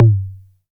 low floor tom one shot g key, tom one shot tuned to the G note; tom drum samples